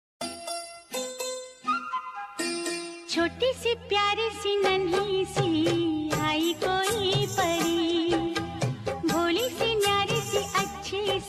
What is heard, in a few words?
whatsapp ringtone